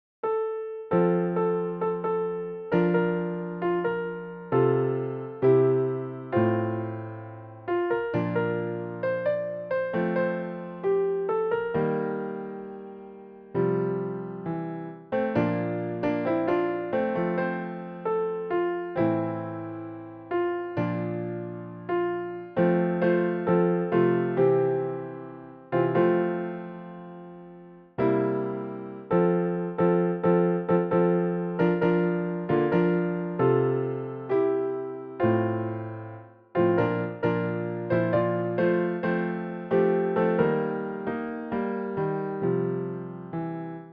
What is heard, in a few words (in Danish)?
alle stemmer